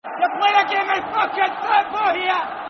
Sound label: Just A Game [Football Chant (Soccer Songs)], Falkirk; fanchants